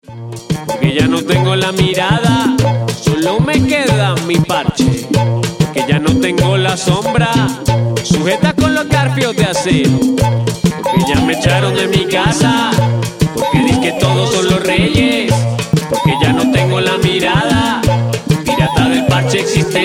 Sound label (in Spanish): Trompeta